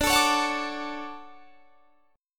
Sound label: D Major 7th, chord; DM7 strummed